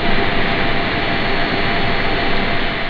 computer loop

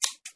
scissors cut

